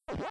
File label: pellet, pacman